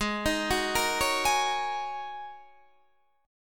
Ab 9th Suspended 4th Ab down Guitar Open D

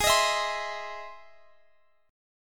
E Augmented Major 7th Ab

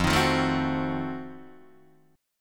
F 7th Flat 9th, Guitar Open D

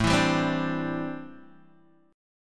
A Minor 11th A Guitar Open D

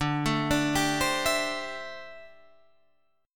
D 9th Suspended 4th, D

